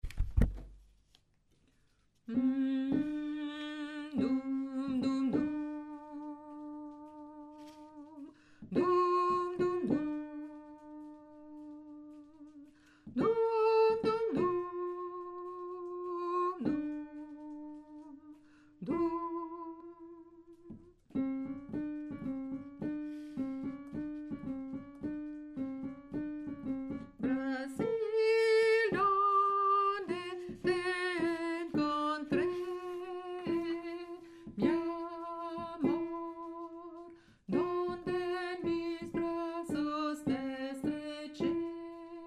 brasil mezzo, choeur femmes

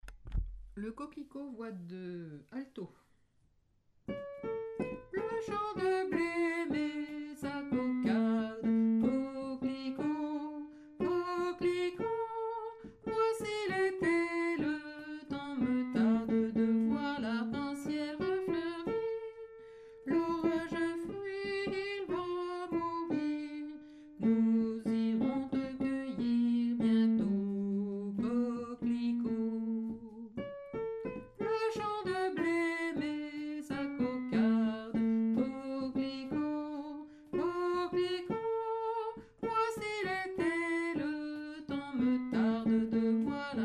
alto, choeur femmes

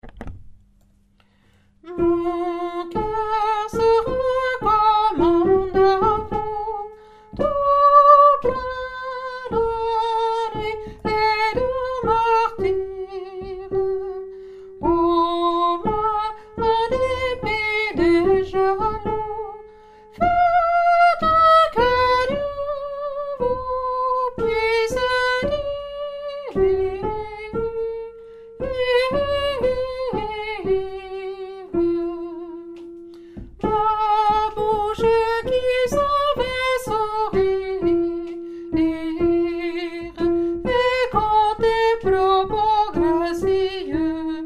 soprano

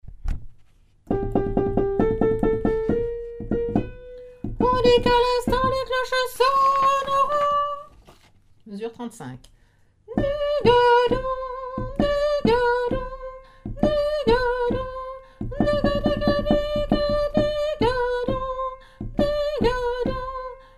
soprano, chorale

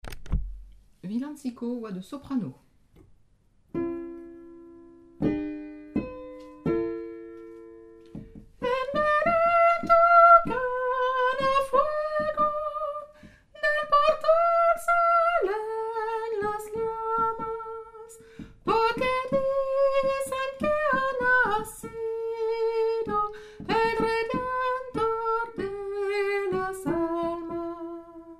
villancico soprano

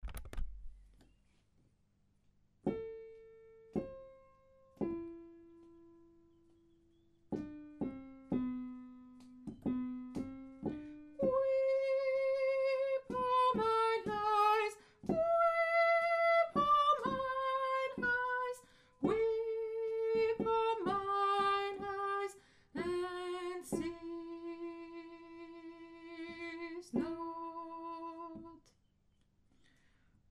soprano, chorale

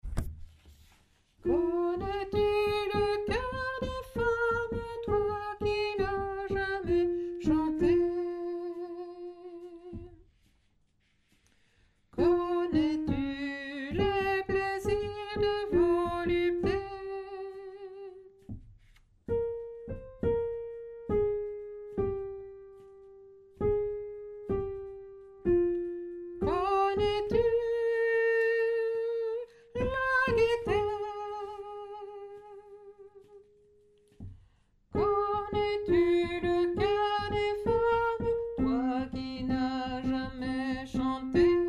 le coeur des femmes hommes

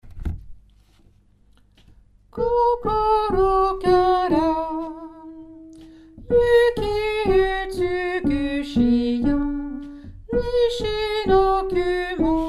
numero 6 alto

